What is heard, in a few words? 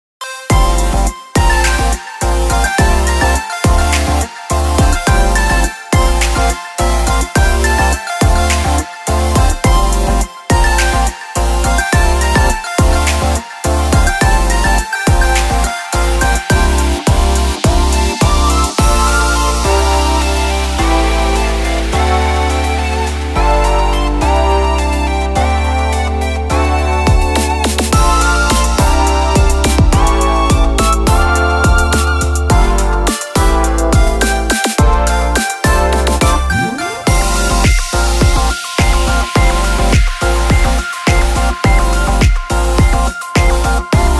bgm